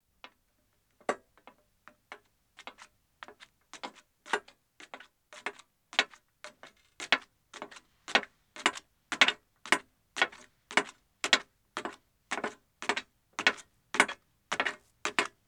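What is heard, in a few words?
Metal Stairs Ascending, 1 Woman, footsteps; BBC Sound Effects Library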